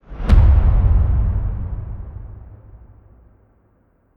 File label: Boom Shot; Evolve